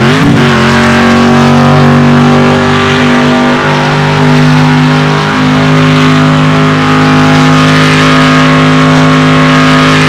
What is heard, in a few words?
simulated vehicles shiftdown